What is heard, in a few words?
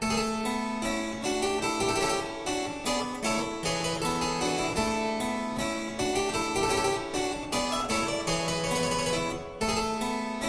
menuet